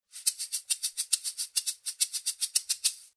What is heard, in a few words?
small chocalo